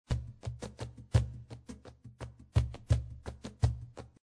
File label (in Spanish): ALEGRIAS 85, flamenco